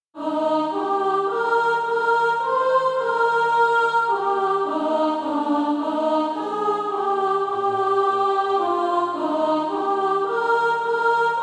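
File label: soprano, Practise Music; From Greenlands Icy Mountains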